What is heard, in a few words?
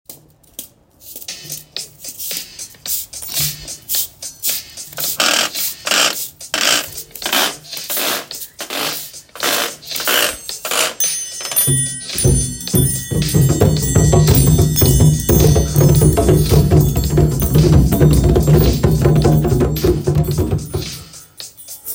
storm percussion